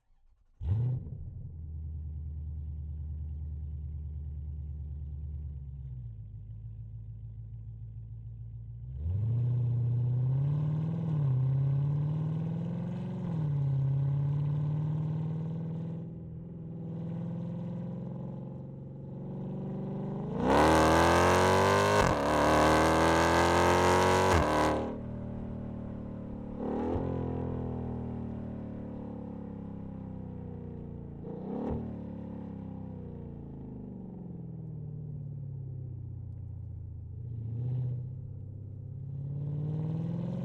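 Jeep SRT8 Onboard Start Drive Gearshifts Exhaust Center; 2014; DPA4062; Pole Position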